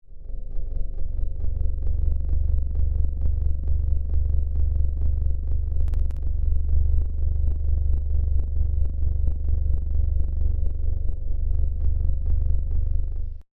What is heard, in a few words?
blue B call, blue whale; baleen whales